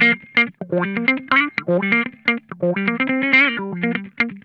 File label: BUSY, Funk Guitar; Zero G - Funk Guitar